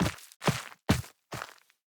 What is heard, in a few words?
Steps; Sacrifice Forest Night End; Operator Intro